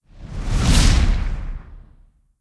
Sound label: Strong Wind; blue archive